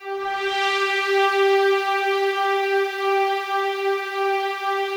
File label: BIG SLOW G4, BIG SLOW PAD, Yamaha VP-1; Music Store; 90's Sample CDs